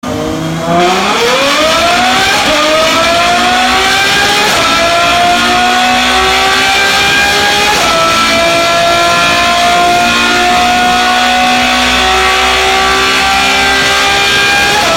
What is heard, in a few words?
Ferrari